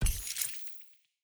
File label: UI Research Started; A Year of Rain